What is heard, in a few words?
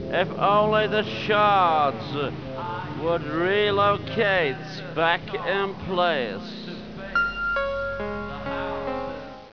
shards